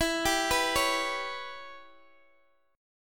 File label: E Minor 6th E, Guitar DADGAD